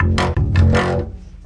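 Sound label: acoustic bass super rattle; MELODIC LOOPS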